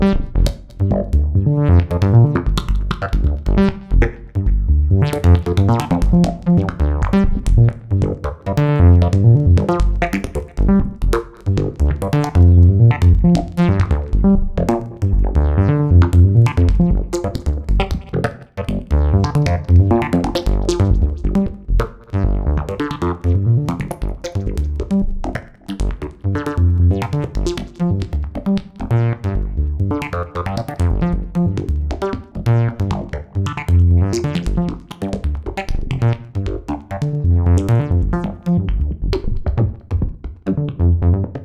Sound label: Bleeps